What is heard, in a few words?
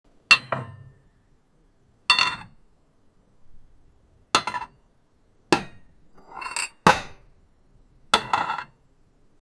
there is a plate on, tableware